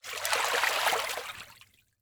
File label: Liquid Water Water Hand Waves Single